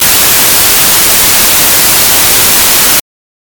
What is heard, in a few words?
noise white